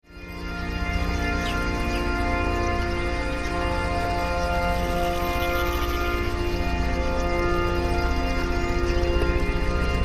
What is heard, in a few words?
Helicopters